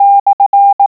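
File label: SLANTBAR, morse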